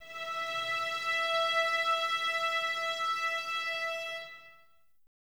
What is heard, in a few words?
VNS E 5, Violins, String Ensembles; Miroslav Vitous